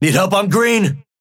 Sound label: Synth ping need help; green